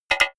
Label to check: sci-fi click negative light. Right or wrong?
Right